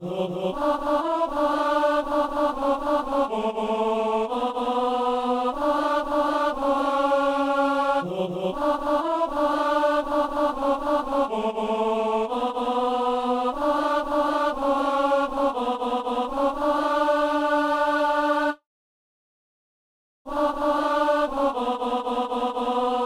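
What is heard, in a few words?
tenor; Acclamez le Seigneur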